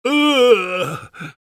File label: pain severe, es